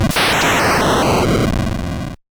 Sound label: finishing blow, attack sound; カタナ１